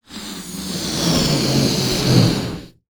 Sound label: Balloon, cartoon Foley Fx